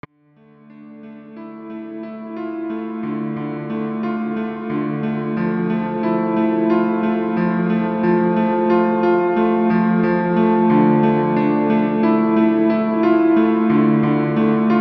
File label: piano